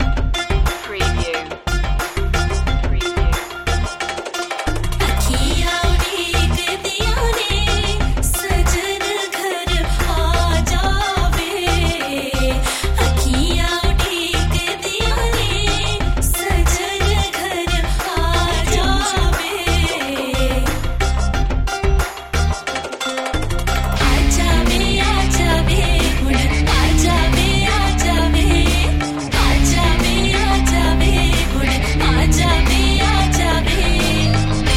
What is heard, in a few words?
the magic sitar